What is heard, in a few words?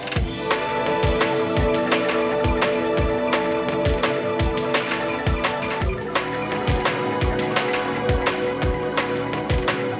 Polyfonic signal; Separation of Sound Sources by Convolutive